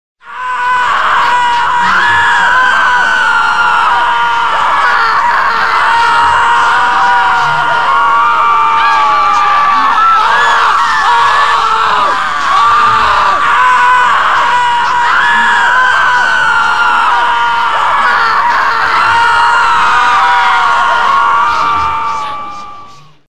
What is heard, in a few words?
AMBIENT Tome Room; Eternal Darkness Requiem